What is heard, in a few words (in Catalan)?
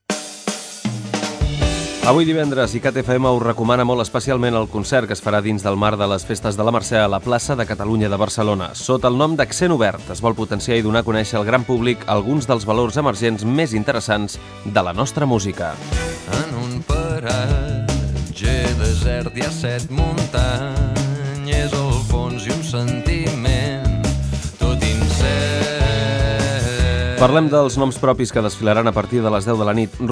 musica amb accent obert